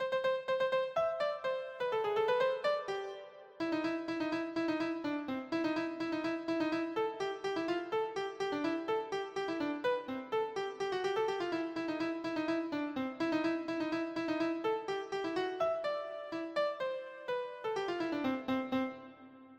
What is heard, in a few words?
radetsky march keyboard